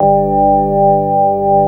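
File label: CX3 organ G1 L, Keyboards Old School; USB Soundscan vol.09; AKAI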